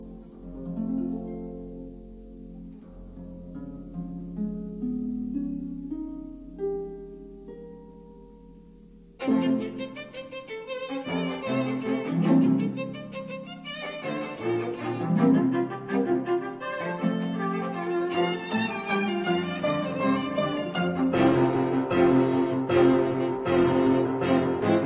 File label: version for harp piano and string quartet Majestically; Ennanga; Louis Kaufman